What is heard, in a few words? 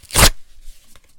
Clean Paper Rip; Mike Koenig